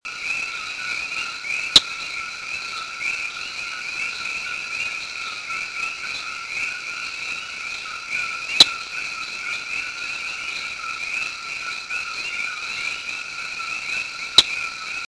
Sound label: Eleutherodactylus richmondi El Yunque National Forest Puerto Rico; north-eastern Puerto Rico; Its voice is a "teck", uttered from the ground or a low bush.; West Indian